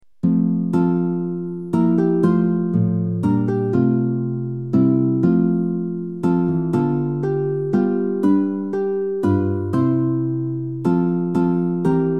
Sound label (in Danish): Ps23 koral